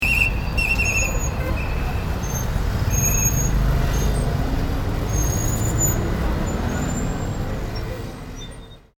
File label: whistle of traffic police assistants